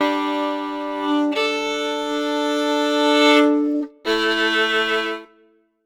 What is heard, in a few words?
Celtic Violin; Sonic Foundry (Sony Creative Software); Continental Drift Aftershocks